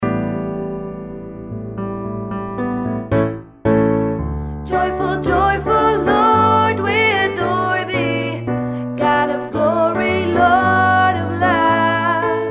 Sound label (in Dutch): Joyfull sopraan